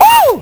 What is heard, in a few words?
Waoh! 12281Hz; Sonic 3; M8; Genesis